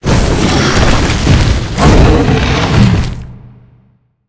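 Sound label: boss spawn; galiardo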